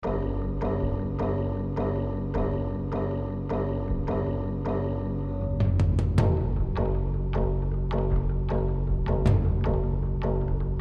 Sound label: Rage Combat; Joel Rage Combat Chunk 7